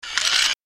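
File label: Mechanism Fast On off, servos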